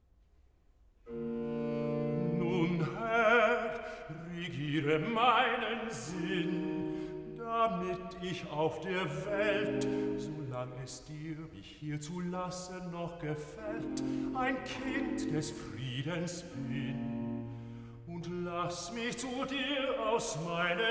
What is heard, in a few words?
Cantata, Recitativo ed Arioso (Bass); BWV 158 'Der Friede sei mit dir'; 'Nun, Herr, regiere; Bach; Arnstadt; 1st Sun After Easter